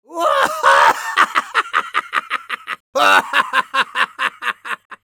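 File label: laugh long, Spanish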